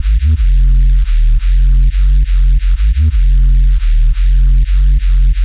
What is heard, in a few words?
Drum Bass